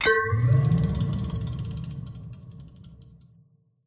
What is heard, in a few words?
respawn anchor activate